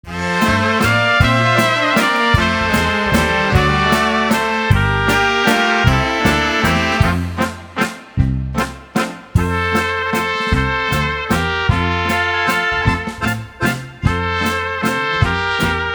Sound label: Roadside Waltz